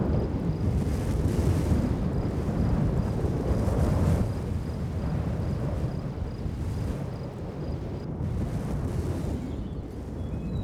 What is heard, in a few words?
battlefield; rome total war